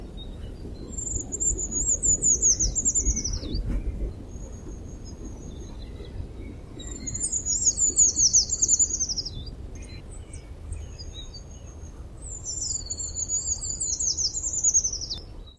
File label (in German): waldbaumlaeufer